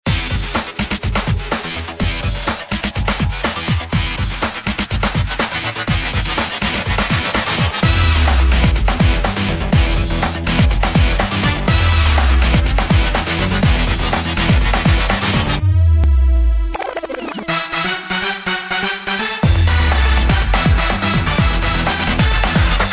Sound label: Electro; Deal or No Deal; Wii